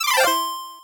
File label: 8bit se